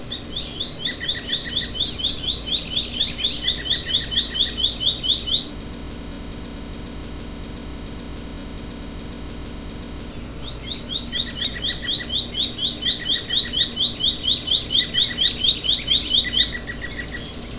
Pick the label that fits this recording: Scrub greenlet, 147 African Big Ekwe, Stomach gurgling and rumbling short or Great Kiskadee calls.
Scrub greenlet